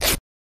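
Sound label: Cloth Rip Medium Short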